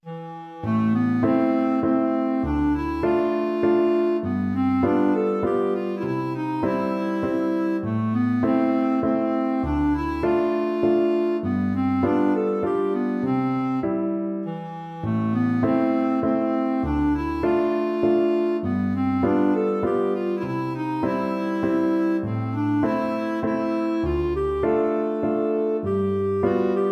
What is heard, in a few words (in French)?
Valse; Chopin